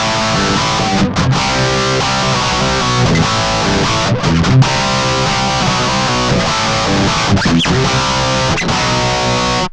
Guitar Licks

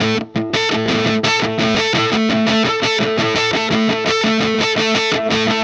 Static FX Guitar

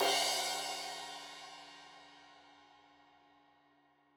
Crash 15in L edge, Cymbals Stereo; Deeply Sampled Drums

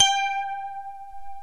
BOTL MARIMBA; OMI - Universe of Sounds

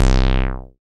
SYN TB SUB, Roland TB-303; Sound & Vision - Gigapack I CD 2

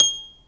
HRP C. HARP; Roland - LA Composer vol. 1

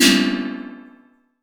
Snare Room, TR-606 Bent Samples; Sonic Foundry (Sony Creative Software); The Electronic Music Manuscript Collection; Battery 3 Sample Kits

